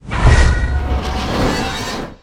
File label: Target Lock; smite; horus